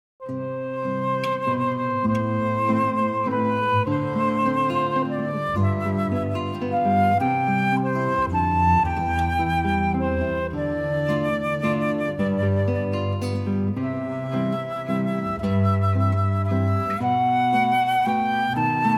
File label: guitar flute bass trio